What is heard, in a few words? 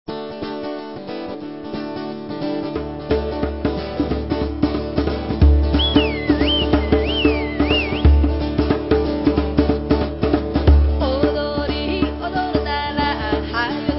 rock